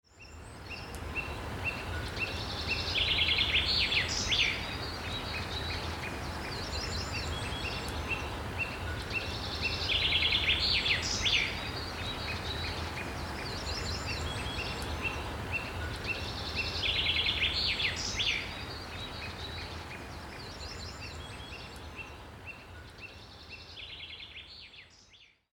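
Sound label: ambience hitchhike; Wario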